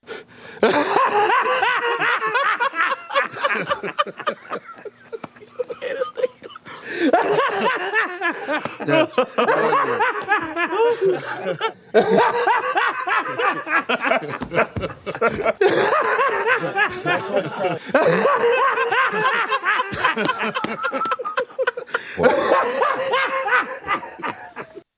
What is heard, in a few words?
LAUGH; CHARLIE MURPHY